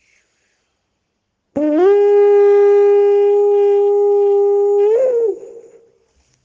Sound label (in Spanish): Sonido Bocina; Hermand del Silencio